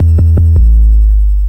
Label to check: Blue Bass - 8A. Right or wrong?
Right